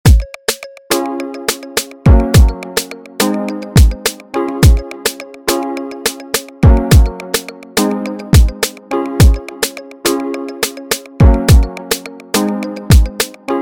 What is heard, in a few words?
Ghanaian type of afrobeat